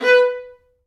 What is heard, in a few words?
Vla B3; Digital Sound Factory Virtuoso